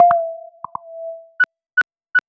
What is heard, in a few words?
recharge battery; Nokia N900